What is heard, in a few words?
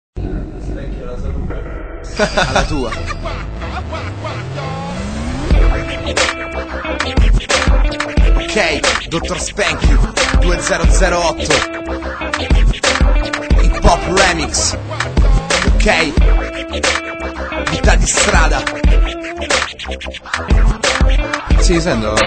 hip hop remix